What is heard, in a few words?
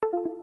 unplug